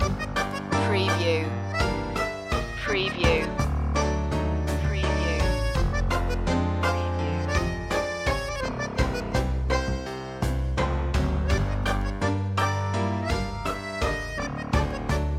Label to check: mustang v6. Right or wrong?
Wrong